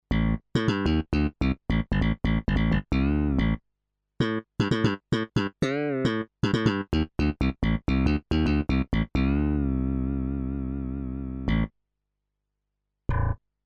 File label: Roland S-330 Slap Bass